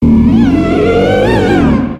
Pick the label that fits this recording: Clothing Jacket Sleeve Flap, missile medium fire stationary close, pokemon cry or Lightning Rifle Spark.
pokemon cry